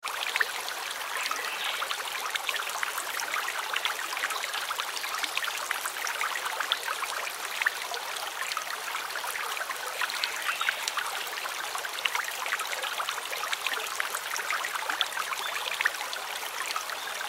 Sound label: River ambience water flowing gently and calmly